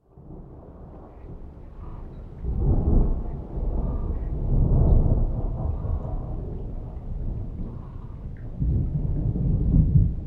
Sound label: thunderstorm over a lake